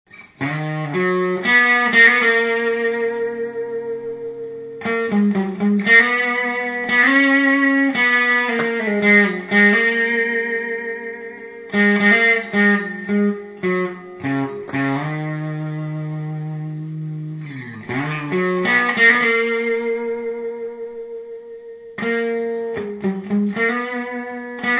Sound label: hum